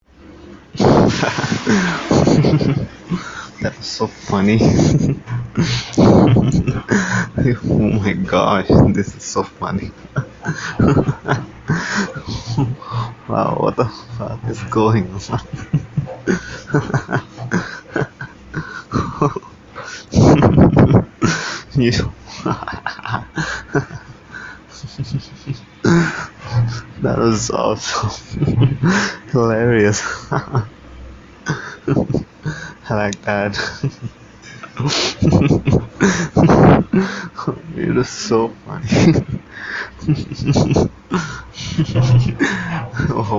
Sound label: laugh